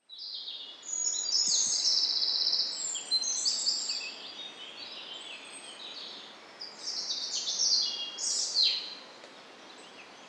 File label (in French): les oiseaux au bord des chemins